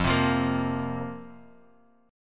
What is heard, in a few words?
F Suspended 4th, chord; Fsus4 strummed; 13,15,15,15; JGuitar